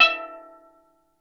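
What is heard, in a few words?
steel drum E3, tonal percs; Crypton - DrumBase5000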